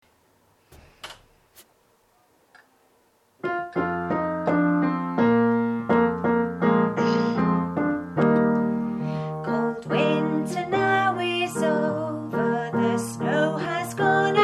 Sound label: cuckoo cuckoo